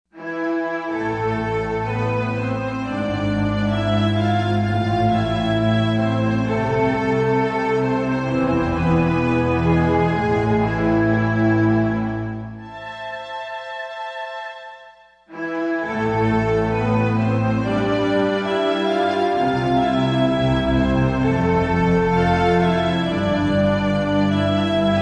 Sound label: Full String, Yamaha TG55; Factory Presets